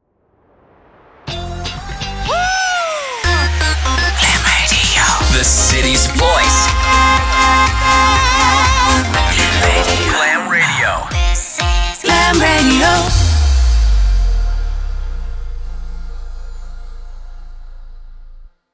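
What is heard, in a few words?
Sung Jingle, F minor 122bpm, Slogan; Glam Radio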